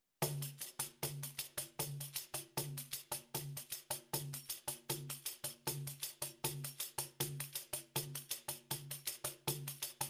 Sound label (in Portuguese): samba marcacao, pandeiro